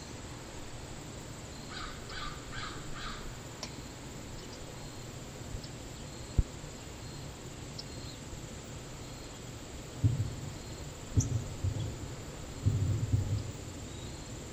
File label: Anomalous Environmental Sounds